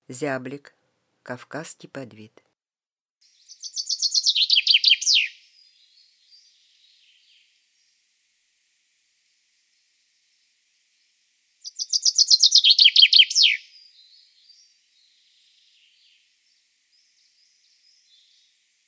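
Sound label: zyablik voice, chaffinch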